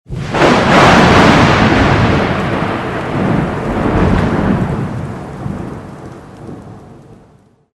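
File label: sound of lightning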